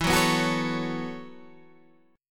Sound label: B 7th Suspended 2nd Suspended 4th, chord